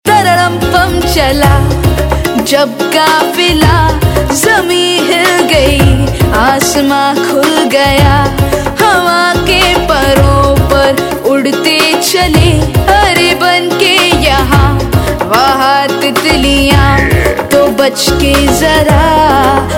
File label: Child Version Ringtone, Bollywood; Love Day - Pyaar Kaa Din